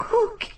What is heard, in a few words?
notification; cookie